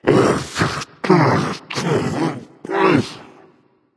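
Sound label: nonverbal grumble; smite; voicepack; merlin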